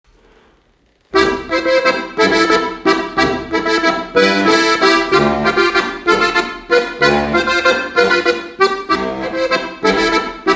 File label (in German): Walzer